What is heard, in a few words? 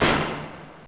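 Orchestra Hit; Genesis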